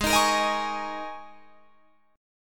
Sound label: Ab Suspended 2nd Suspended 4th Ab down Guitar Drop D